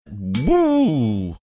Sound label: whoooo